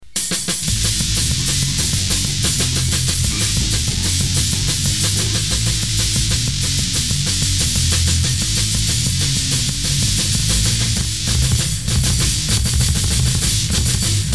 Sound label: noize terror